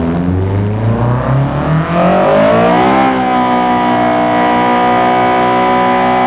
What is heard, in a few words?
rev, vehicles cars; civic type r; tdmcars